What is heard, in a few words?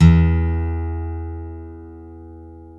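acoustic guitar, country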